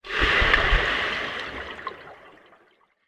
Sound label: person dive into swimming pool underwater recording